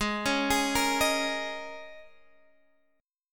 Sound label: Bb Minor 11th